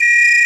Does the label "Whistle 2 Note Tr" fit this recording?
Yes